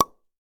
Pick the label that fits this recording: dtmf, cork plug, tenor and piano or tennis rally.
cork plug